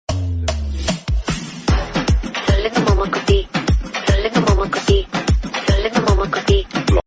Remix Tamil Ringtone

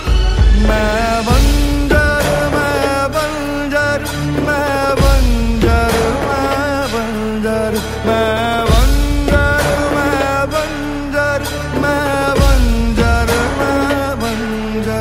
Bollywood Ringtone

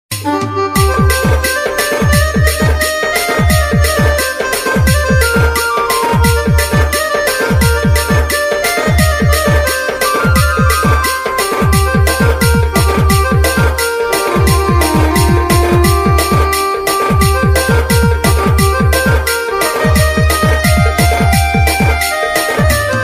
Odia Music Ringtone

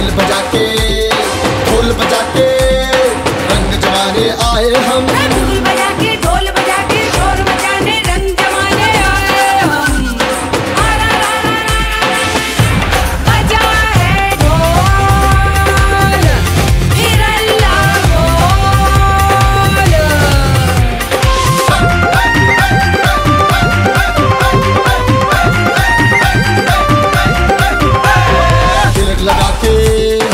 Anthem Song; IPL 2018; Rajasthan Royals

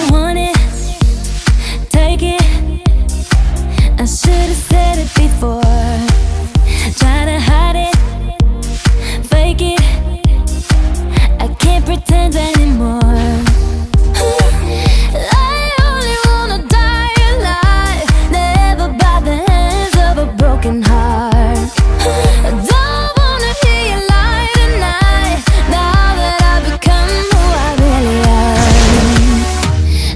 Hollywood Ringtone

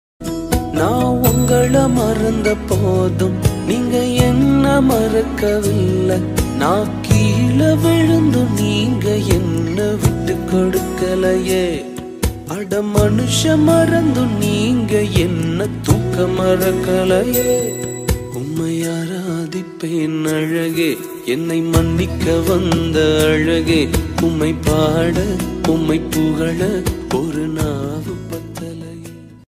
Tamil Christian Ringtone

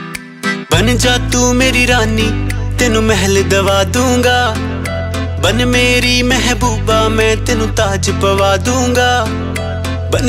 New Punjabi Song Ringtone

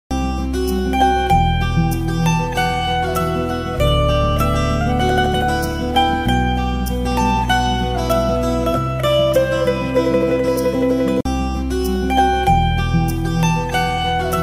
Ringtone Instrumental

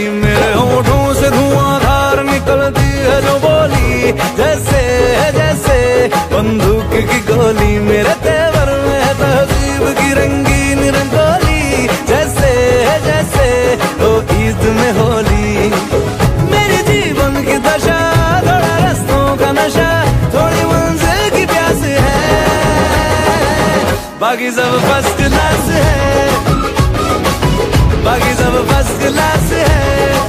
Song Ringtone

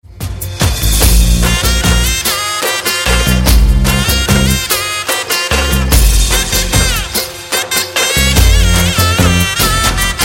Music Ringtone

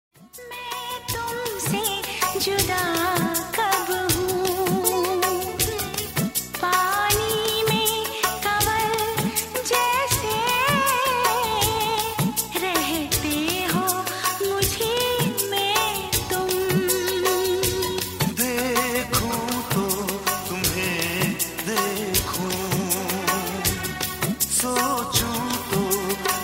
Old Hindi Song

